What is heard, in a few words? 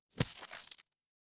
cartoon splat